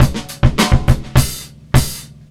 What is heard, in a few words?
beat, Roots Funk; USB Soundscan vol.41; AKAI